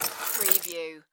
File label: Key Ring Pick Up; Sound Effects Bible